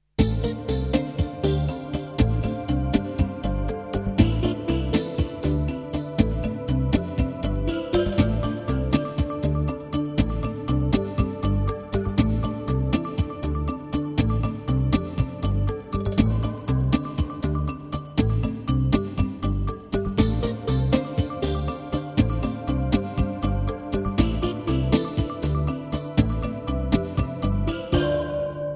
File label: Jingles; 90 DDD Jingles